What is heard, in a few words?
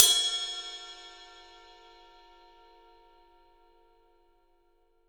ride cymbal; 3D Sound; 3D Drums